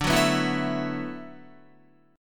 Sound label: D 6th Add 9th D, Guitar; D6add9 strummed; JGuitar